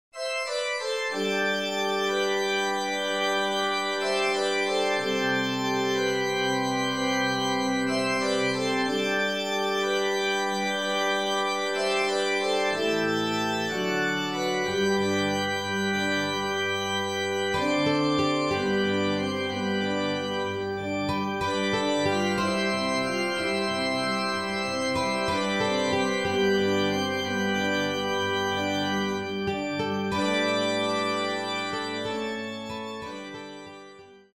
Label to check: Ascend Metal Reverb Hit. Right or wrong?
Wrong